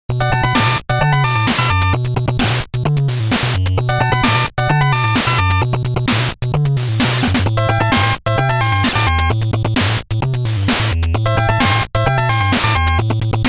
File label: Transformation Menu; Widget; NES